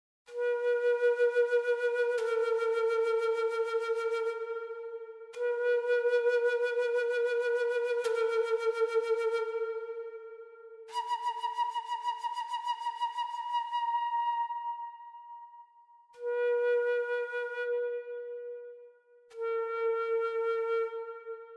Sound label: native american flute